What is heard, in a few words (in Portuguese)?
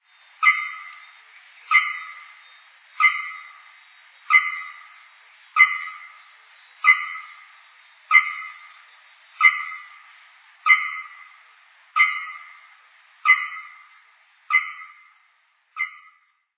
araponga do nordeste